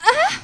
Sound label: pain